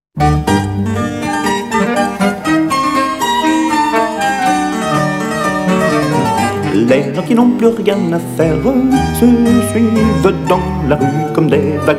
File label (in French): Fugue